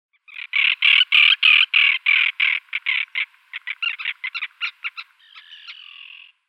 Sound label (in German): Flussseeschwalbe Sterna hirundo; Vogelstimmen